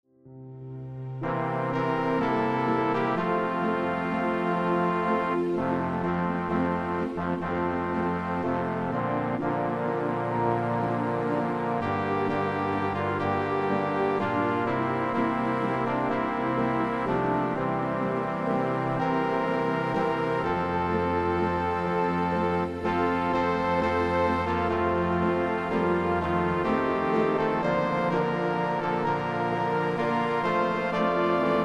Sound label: midi